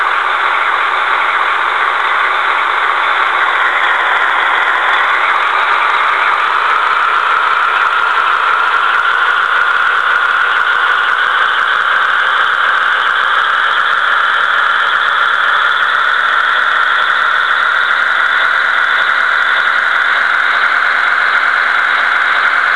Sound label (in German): fahren